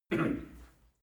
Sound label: male harrumph; menschen; koerpergeraeusche; soundpool